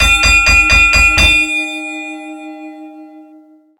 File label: post match bell, anime